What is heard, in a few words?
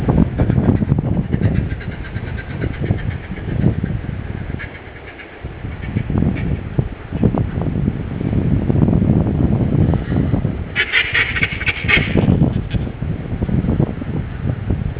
fulmar